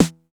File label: SNR, TR909 Snrs; Cheez Snrs